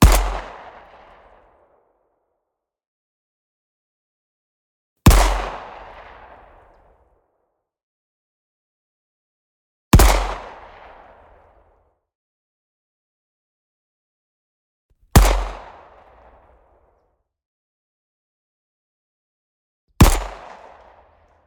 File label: Pistol Luger Shot, Weapons of World War II, Designed; Super Thump